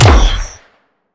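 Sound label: Sci weapon Shot Medium Gun Assault Rifle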